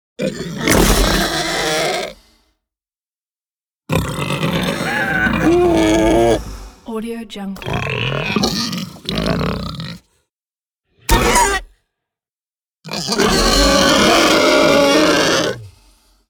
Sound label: monster boar; osfx